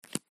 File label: card flip; new-years